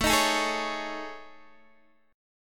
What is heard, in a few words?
A Major 7th Flat 5th, Guitar, DADGAD